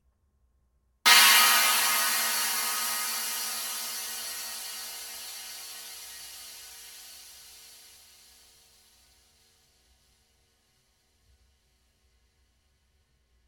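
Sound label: 22in Swish Knocker with Rivets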